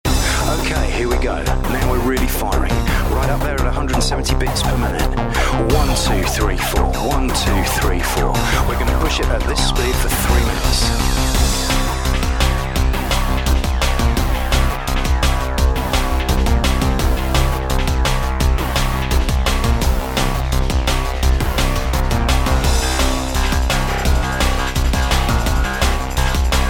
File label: 170 BPM